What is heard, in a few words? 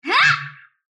sylph attack short; dota; dark willow